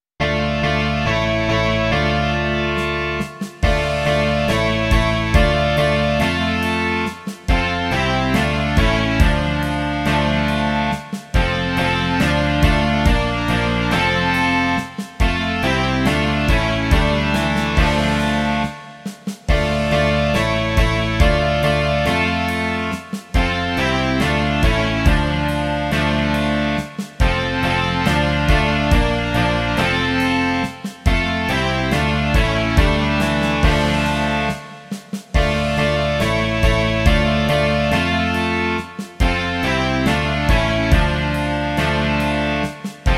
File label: rock